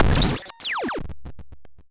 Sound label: screams robot